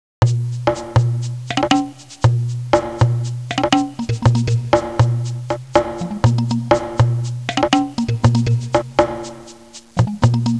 suonerie musica ritmica

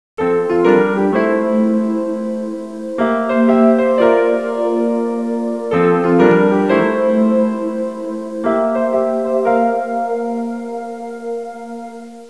suonerie musica pop

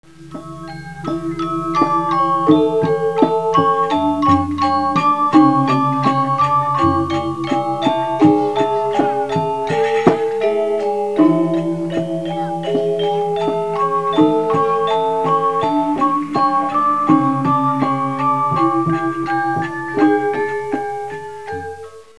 suonerie musica ritmica; rhythm

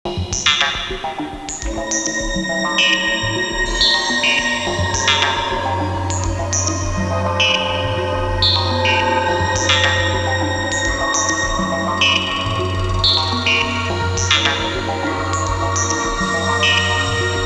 musica elettronica

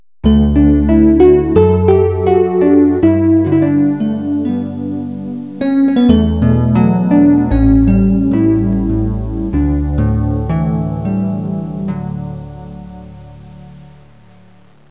musica newage

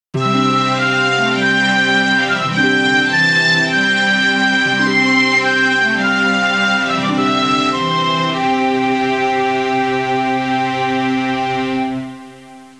musica sinfonica; symphonic

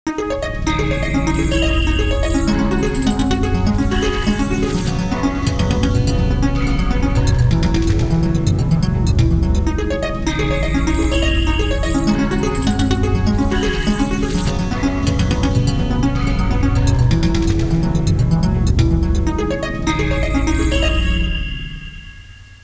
musica elettronica

